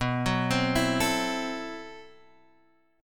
A-Minor 6th B, chord; Am6/B arpeggiated; JGuitar

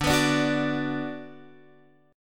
D 6th D down Guitar Open F, chord; D6 strummed; JGuitar

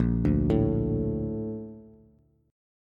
A sharp Major 7th, Bass

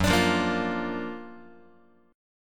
F sharp Augmented Major 7th F sharp Guitar; strummed

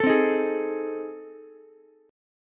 A Minor Major 7th Flat 5th A, Ukulele; strummed; AmM7b5; 14,12,11,11; JGuitar

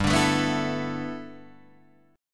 G 6th Add 9th, Guitar, Open D

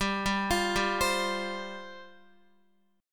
G 7th Suspended 4th G down Guitar

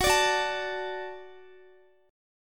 F sharp Minor Sharp 5th F sharp, Guitar; F#m#5 strummed; x,x,18,19,18,17

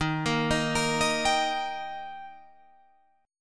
Eb Major Eb Guitar Drop D

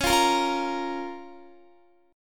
Db 6th Db, down, Guitar; strummed

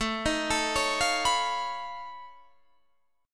B 9th Flat 5th A

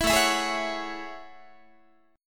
A Major 13th Guitar; AM13 strummed; 19,17,19,18,17,17; 4A,4E,5A,5D,5G#,6C#

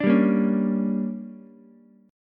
D Major 7th Suspended 4th Sharp 5th, Ukulele; DM7sus4#5; strummed; JGuitar

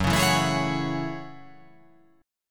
F sharp Minor 9th F sharp

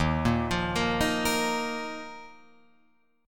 D sharp Suspended 2nd D sharp, Guitar Open F; arpeggiated; JGuitar

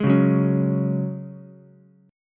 Ab 7th Suspended 4th Ab Ukulele; Ab7sus4; 1,1,2,4; strummed; chordshape; JGuitar

